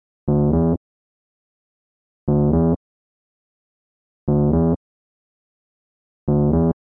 bassline

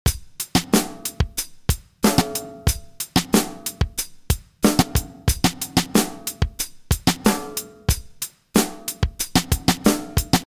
beat

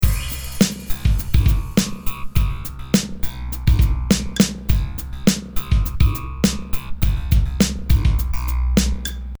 hiphop beat

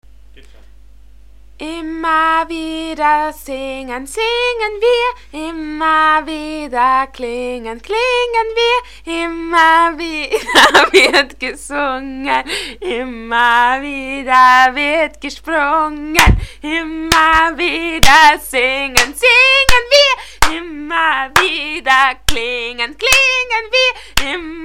acappella

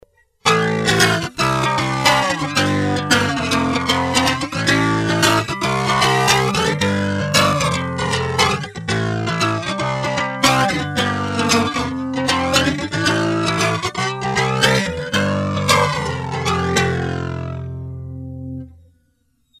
g riff

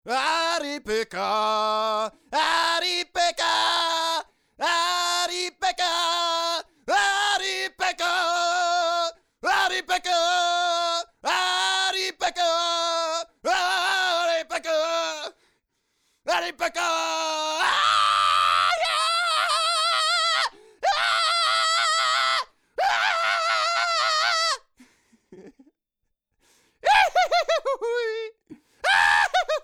voc

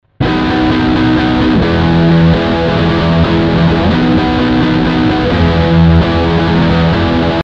distorted riff start 130bpm

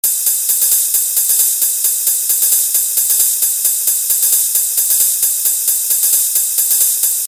only open hihat

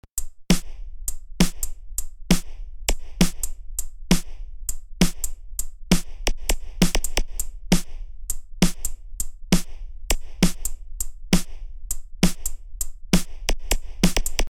drums fulls no kick

